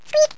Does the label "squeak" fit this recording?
Yes